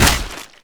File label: hook impact; weapons; dota; pudge